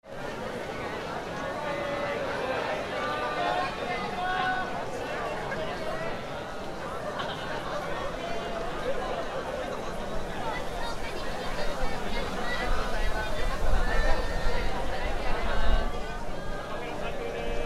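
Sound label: entrance ceremony, Fukushima University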